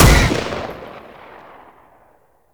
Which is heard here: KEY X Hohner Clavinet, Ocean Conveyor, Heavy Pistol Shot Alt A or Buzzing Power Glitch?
Heavy Pistol Shot Alt A